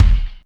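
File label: POWER DOWN, KICKS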